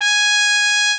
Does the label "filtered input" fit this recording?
No